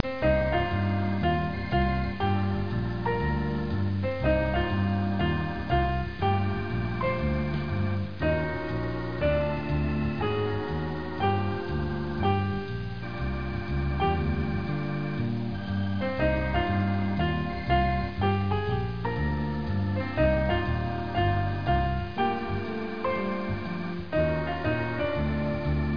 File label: Piano Tango; Spy Fox in Dry Cereal